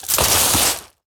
Bodyfall Gravel; SFX Kit; Bodyfalls

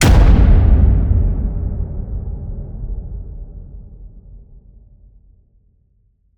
HIT Slam, Cinematic Hits Designed

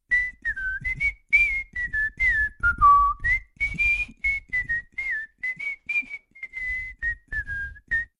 Whistle; RadwinIsland

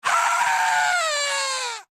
Cowboy Boos; Pitch Black Audio Pong

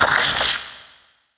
Stepper Hit

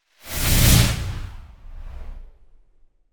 Whoosh Big Air; Hollywood Edge; sound designer's toolkit v2

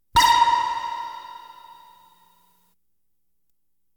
Science Fiction effects, Lasers, Light beams

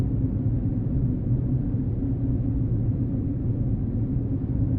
tunnel loop; Blind Drive

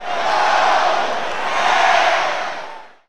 Football Crowd Chant; Crowds

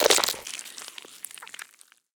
zombie bite; DeadFright

